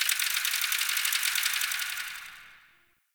High Mechanics, Ambience; Guild; Warframe; Misc Remaster

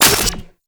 Alt Fire Mech; Warframe; Corpus; Tenet Quanta

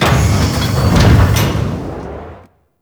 Crew Ship Core Reveal Start; Warframe; Corpus; Railjack

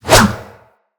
Staff Swing

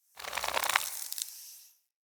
Blind Sand Target Deactivate; Fey; Saboteur

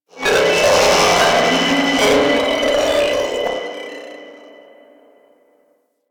Infested Speaker Stack Screech; Ambience; Props; Warframe; Nineteen Ninety Nine

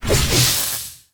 Scythe Charge Whoosh; Weapons; Melee; Warframe; Archwing; Exalted Archwing Scythe

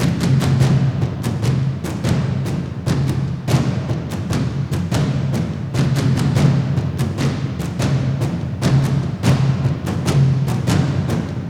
Scene Loop Music; Warframe; Cinematics; War Within

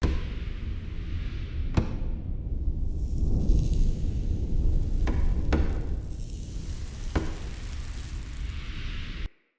House Interior Amb Loop; Houses; Midrath Island

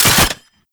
Wrist Gun Dry Fire; Weapons; Warframe; Tenno

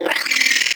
Vocal Angry; Hyena Micro

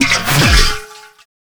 Mech Oil Grenade Throw; Vehicles; Abilities; Warframe; Nechro Mech

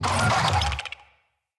Infested Big Ashen Vocal Pain

